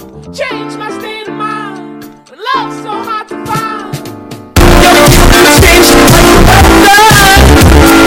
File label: Bass Boosted